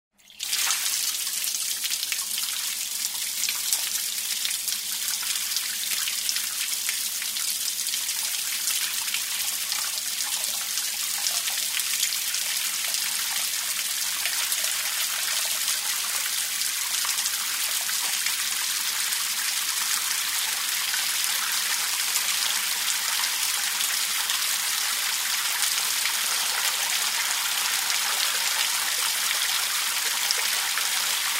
Water flows into a bucket

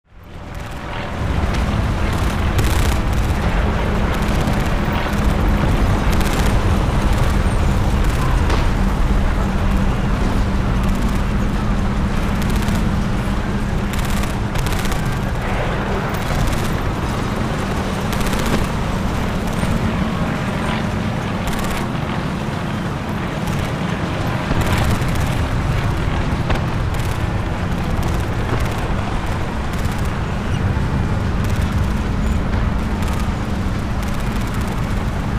War, shootout, shells, aircraft; explosions

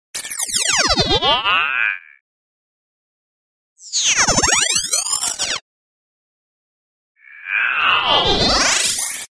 Electronic signal

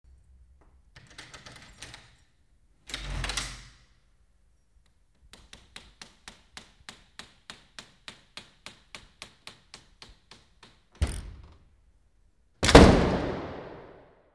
wooden door opens and closes with creak

